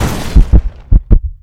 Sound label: defib; weapons